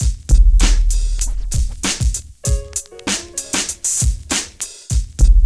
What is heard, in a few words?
BEAT, Hip Hop; E-MU Formula 4000 Series Vol. 1; Hip Hop Nation; Beats 95-99bpm